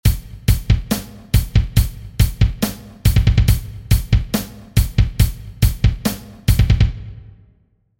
drum pattern metal

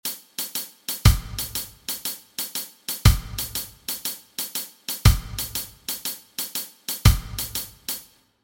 drum pattern reggae

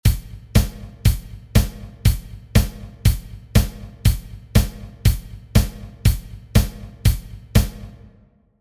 drum pattern pops

